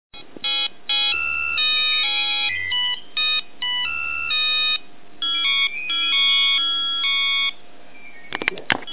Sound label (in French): sonnerie